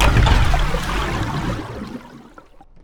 pounce impact; weapons; immortal; dota; slark

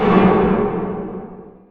searing impact layer; weapons; dota; clinkz

